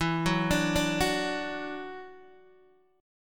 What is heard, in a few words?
E 7th Suspended 2nd, down, Guitar, DADGAD; E7sus2; arpeggiated